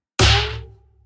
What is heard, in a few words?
mid hit; angry birds epic